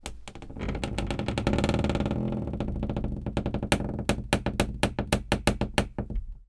household door creak wooden hollow

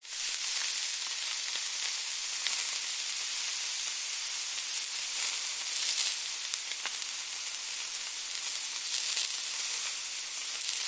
raw chicken breast cook fry pan hot oil sizzle

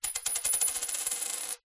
foley money coin set down on glass spin; zapsplat

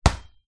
sport soccer ball bounce on concrete ground

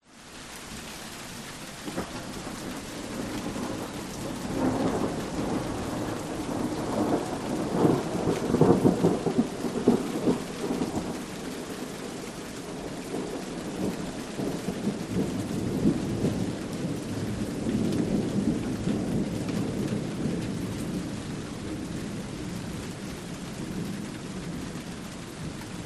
nature thunder distant rumble light rain wind

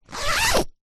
sleeping bag zipper; zapsplat; foley

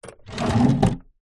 household drawer wood empty pine open or close; zapsplat

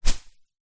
plant fern broad leaved hit ground; zapsplat

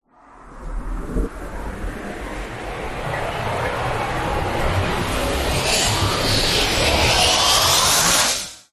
Sci-Fi Power Up; Ryan Van Eerde

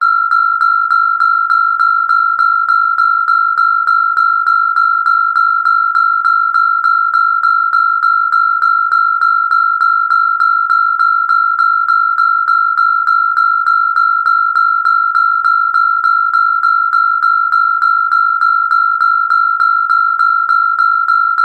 emergency alarm beep tone warning; zapsplat

